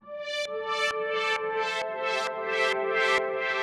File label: 132 A, uk garage, Synths; Solipsa; 132bpm Lines n Loops